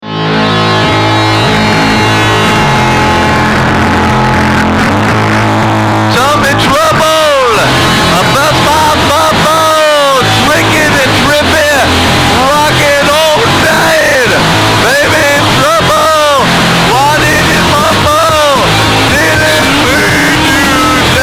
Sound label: Thunder; neubunny